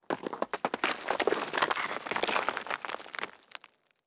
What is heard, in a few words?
debris; World of Tanks Blitz; ambient halloween 2018